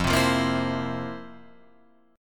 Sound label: F Major 7th Suspended 4th, Guitar, Open F; strummed